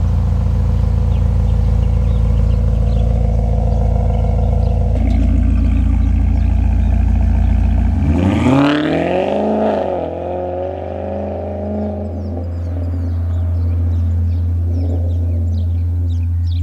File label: Car Engine Maserati Granturismo Switch To Sport Mode And Launch